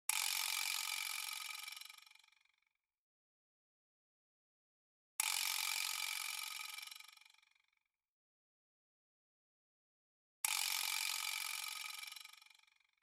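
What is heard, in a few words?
treshotka, rattle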